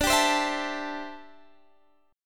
D 7th Suspended 2nd Suspended 4th, Guitar, Standard; 22,22,22,21,22,x; strummed; JGuitar